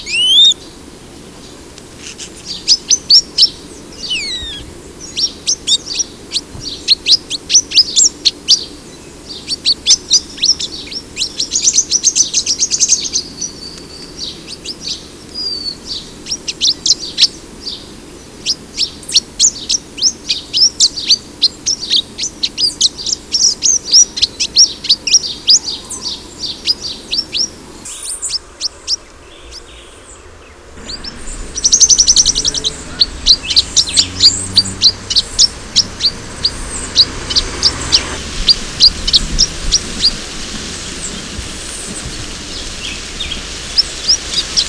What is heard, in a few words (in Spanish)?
viuda colicinta; Tamaño: 12 cm sin contar / la cola / Peso: 15-17 g; Los Colores que Cantan; Las Aves de Puerto Rico en Fotografías